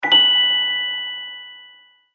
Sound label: Celesta, Email; HTC; Sound Sets